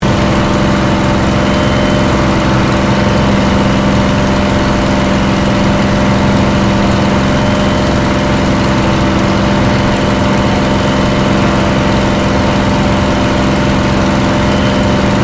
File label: AIRCRAFT SUPERMARINE SPITFIRE MK-VIII FLYING INTERIOR